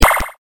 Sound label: LASER